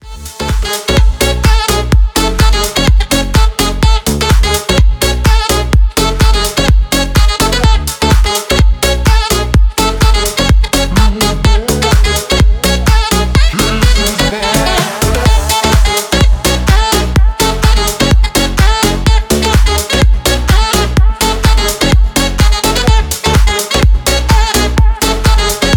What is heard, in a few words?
sax